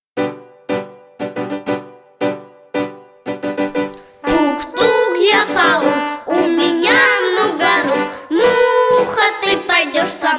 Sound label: pesnya